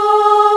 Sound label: choir; sound canvas